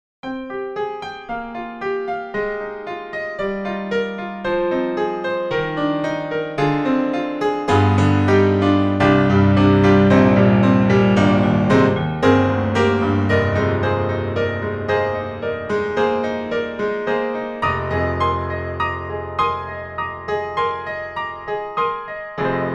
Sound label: Truepianos Sapphireped Module Large; 4Front